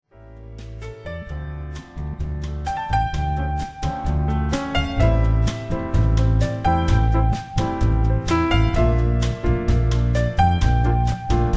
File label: Jazz